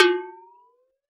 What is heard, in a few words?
WATERBEL, WATERBELL; Univers Sons - Raricussions